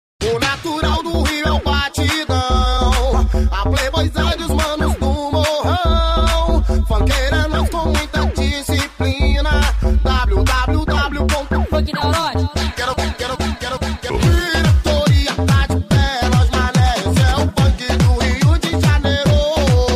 electro funk

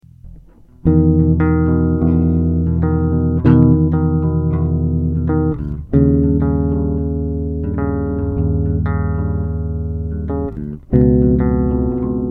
bass solo

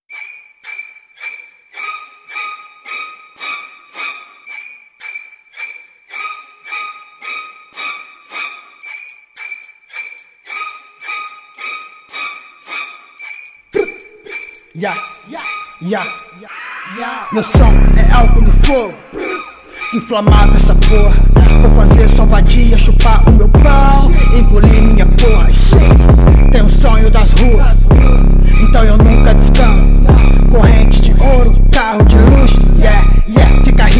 trap brasil

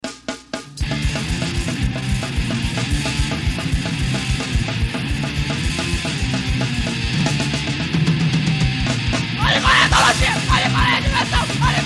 hardcore

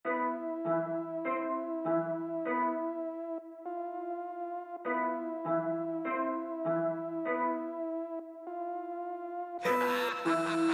hard trap

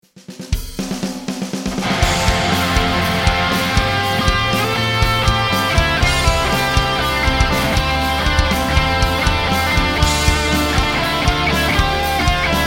big muff

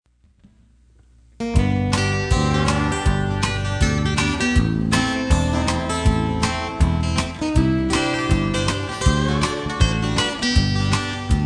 country jingles

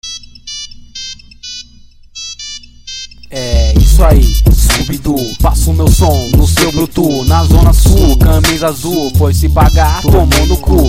nokia tune gangsta

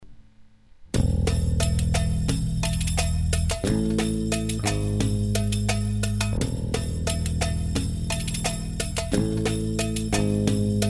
indian groove with bass; trilhasparafilmes

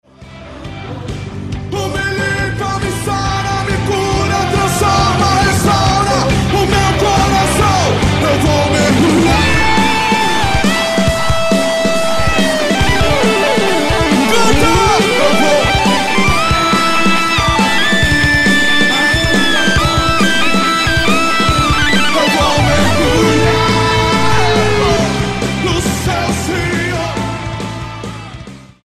Keystrumental solo lead

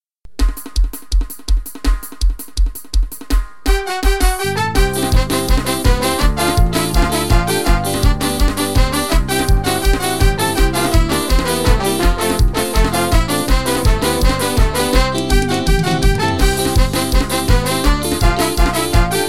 forro

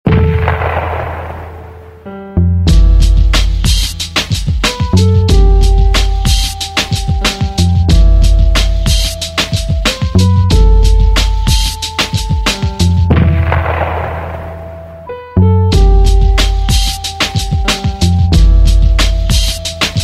instrumental

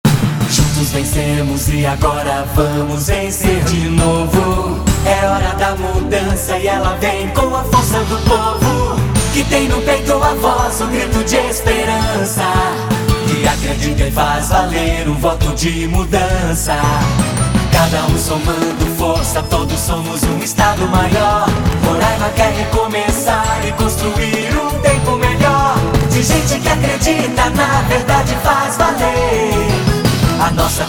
jingle rock; rock 60s

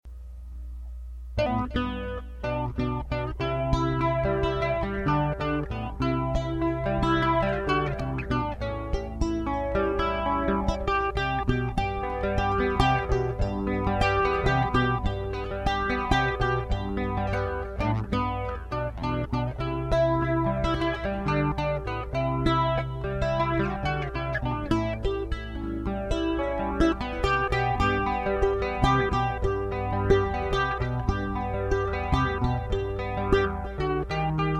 instrumental

